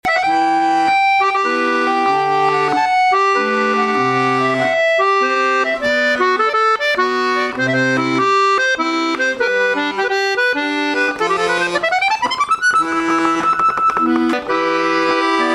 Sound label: mazurka; Suite bourbonnaise